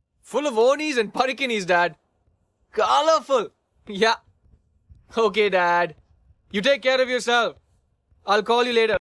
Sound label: happy vocals